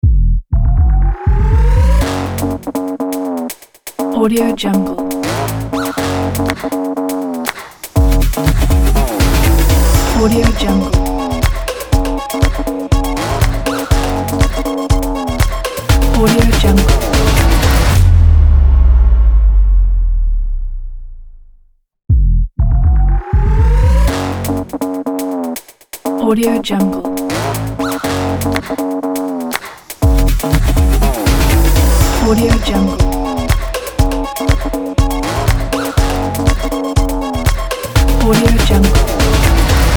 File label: music theme